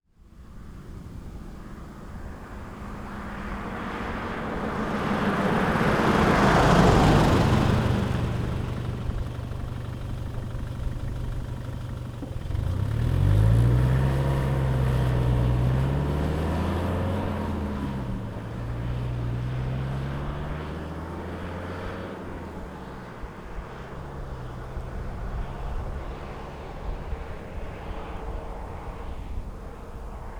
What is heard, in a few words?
Nissan Terrano II 2.7 TDi EXT DRY approach stop drive away gentle back; mono; Soundholder